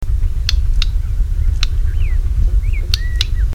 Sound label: siberian blue robin